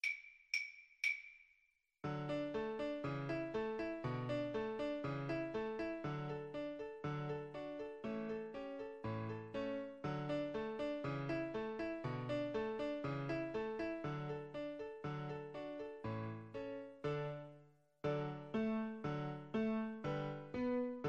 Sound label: String Quartet